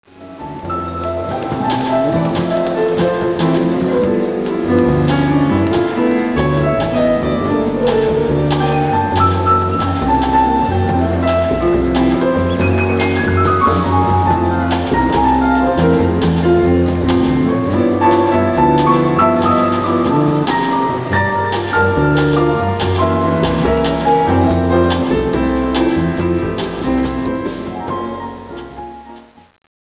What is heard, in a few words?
BGM